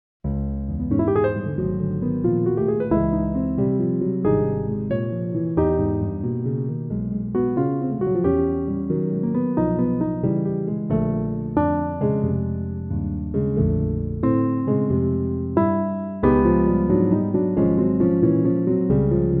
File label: Piano